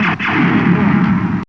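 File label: LASER GUN; East West - Global Sounds; STARS FXS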